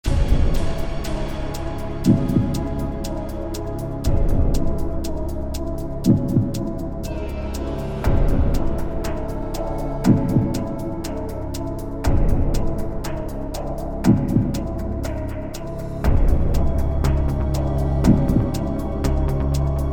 Clock; Millionaire Soundtrack; US Clock (2008)

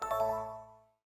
chimes; Windows; Glass

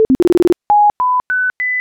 Haptic; i OS; iOS10; nano

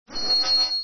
New Email; Windows; Whistler

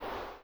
PAGE TRANSITION; Windows Media Center (Windows 7)

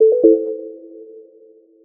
device error; Linux; Celestial 2.1

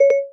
Siri Start Haptic; i OS; iOS10; nano

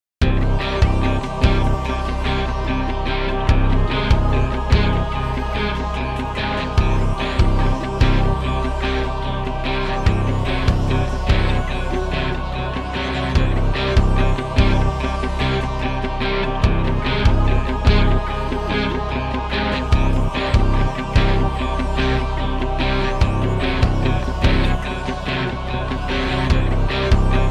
Guitar Loop; Super Millionaire II; Millionaire Soundtrack

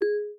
cancel; i OS; iOS10

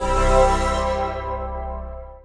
tada; Windows 98 Beta; 98, 2000, ME

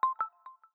Windows Notify Messaging

